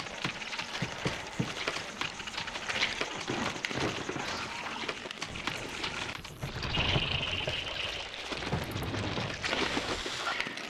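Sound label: Swarm Underfloor loop; silent hill homecoming 2008 gamerip pc ps3 x360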